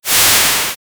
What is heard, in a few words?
energy weapon